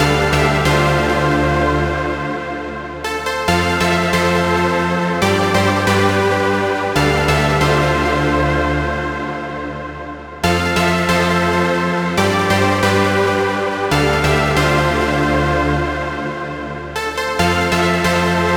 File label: Main Melody WET 138BPM, Dminor; Melody & Synth; Vengeance Samples; Nightfly Kit